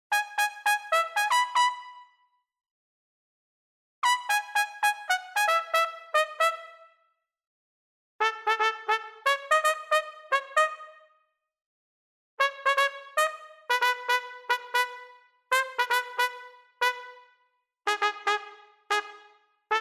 general Music trumpets; Lucha Rumble

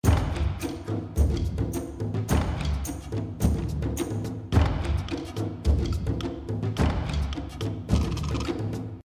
free loop; Shamans Dream 2

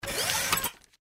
picks arrive; Hot Off The Press; Eyecon

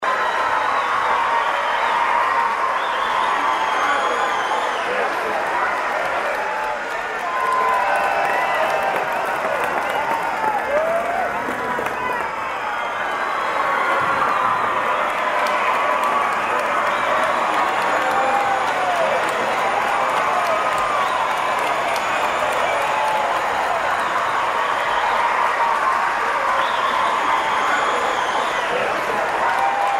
bg ambience; Highland Reels

